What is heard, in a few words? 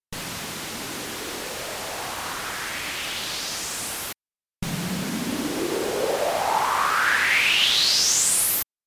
Eq Gain